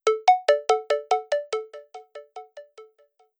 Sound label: ringtone